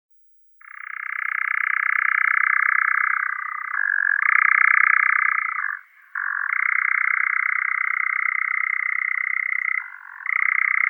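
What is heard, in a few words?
European nightjar, Caprimulgus europaeus; kozodoi obiknovennii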